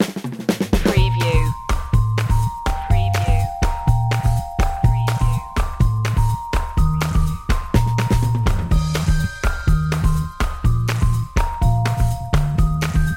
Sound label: bells piano drums bass